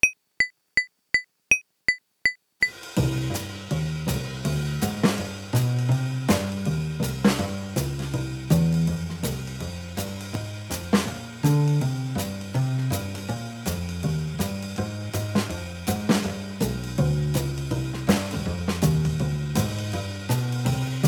Jazz Improvisation Backing Track NO PIANO for compers